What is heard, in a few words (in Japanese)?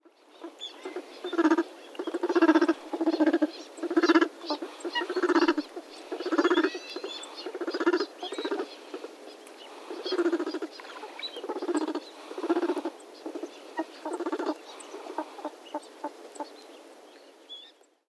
オナガガモ; kawajima